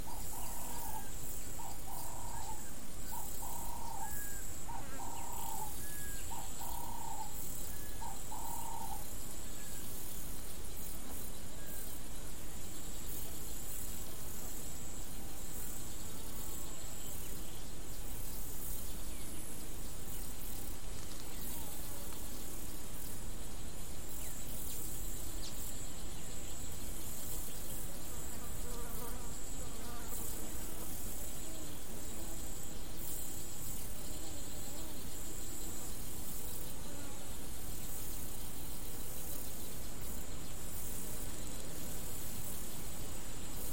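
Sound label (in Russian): спокойное редкое пение со звуками жужжащих мух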